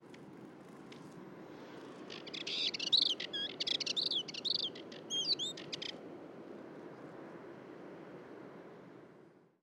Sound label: Dartford Warbler Male Singing At Sintra Cascais Natural Park Lisboa Portugal